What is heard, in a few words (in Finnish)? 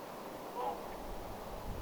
jollakin laulujoutsenella tuollainen aani; onko tama se aani joka kauempaa kuulostaa kuin haukkumisel